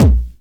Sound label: hard bass drumz